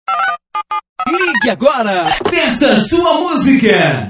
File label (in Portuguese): Vinheta; Brega; Zuacao